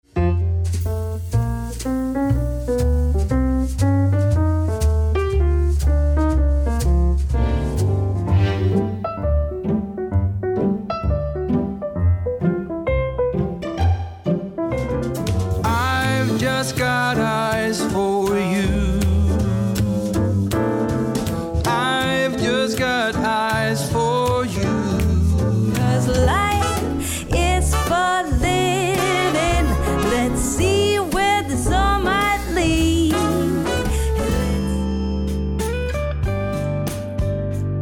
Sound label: Classic Jazz